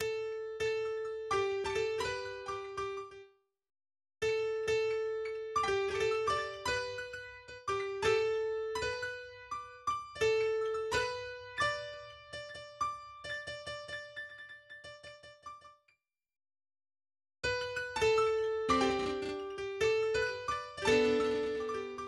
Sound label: violin; Momiji